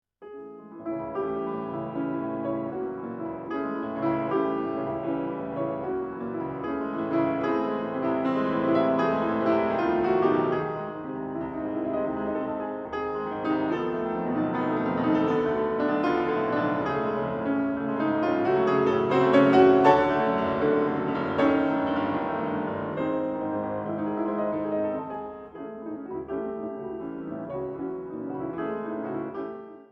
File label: Etude C sharp minor, piano; russian piano music